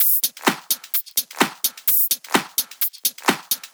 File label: Drumloops 128BPM